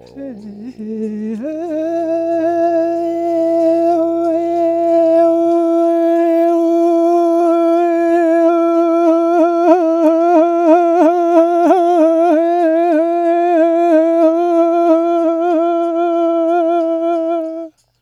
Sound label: MONGOL V HI, Asian Traditions; USB Soundscan vol.60; AKAI